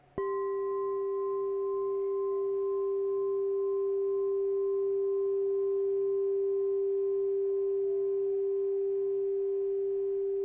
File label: 6 inch G +7, crystal bowl